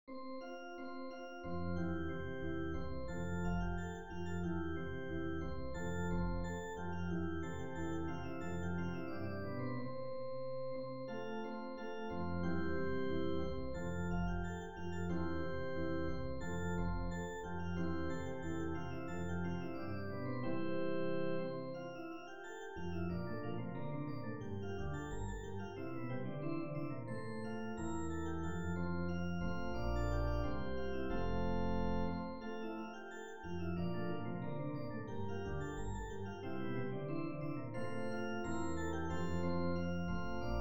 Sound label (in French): gavotte majeur; corelli arcangelo